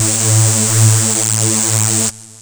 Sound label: saw lead synth